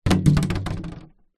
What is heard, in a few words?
foley water cannister plastic 10 ltr down on concrete ground; zapsplat